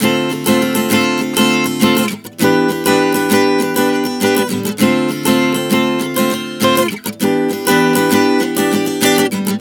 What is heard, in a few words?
Dm7-Em7 100 bpm, acoustic guitar chord progressions